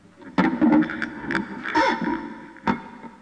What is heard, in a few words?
noise